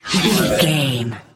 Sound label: ui menu scan